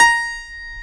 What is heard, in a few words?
PIANO D4; WS#6 JAZZ